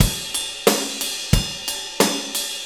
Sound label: 90 ROCK, DRUMS